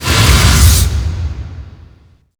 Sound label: skill magic